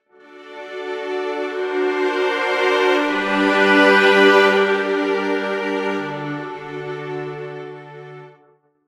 I 7, Augmented chords are often accompanied with a lowered 7th; Augmented chords are often accompanied with a lowered 7th, making them altered dominant chords; V+7/IV